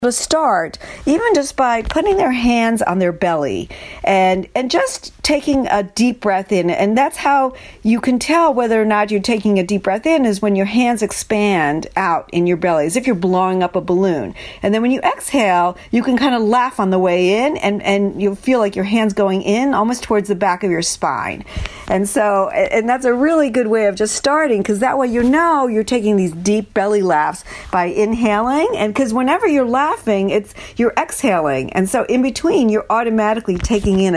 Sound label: laugh